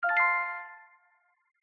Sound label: level unlock; gardenbloom